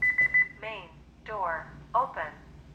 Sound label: main door open